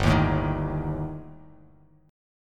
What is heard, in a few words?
G Suspended 2nd Sharp 5th, Bass Standard; strummed; JGuitar